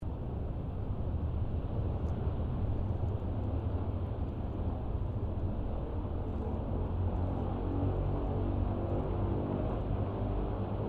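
AIRCRAFT LOCKHEED L-1049 SUPER CONSTELLATION IDLE DISTANT; 1955; Connie, Super Constellation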